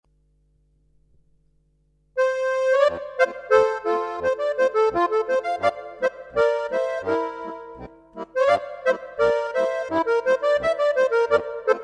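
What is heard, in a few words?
fredl boarischer, Steirische Harmonika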